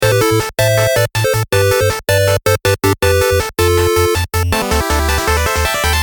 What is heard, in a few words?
Short 8Bit